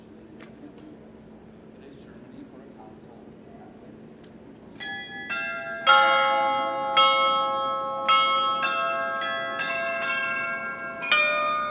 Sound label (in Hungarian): loreta templom deli harangjatek